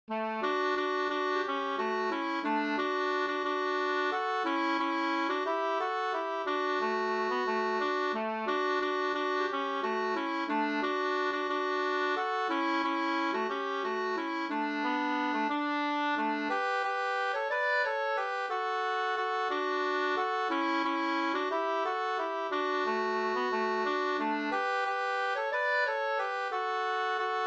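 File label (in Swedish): vals, two-part; Folkarnavalsen av Kaj Nenning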